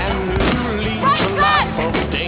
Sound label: light saber